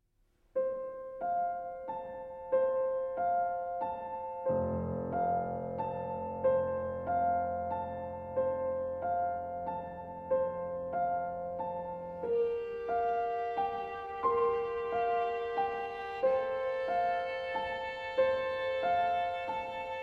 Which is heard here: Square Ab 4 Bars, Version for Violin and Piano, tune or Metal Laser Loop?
Version for Violin and Piano